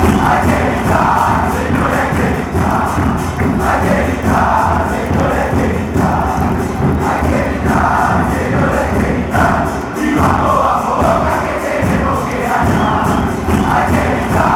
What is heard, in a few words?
we have scream boys, fan chants